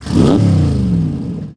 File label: turbo; Pure Pinball